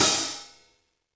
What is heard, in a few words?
hihat - opened; JazzFunkKit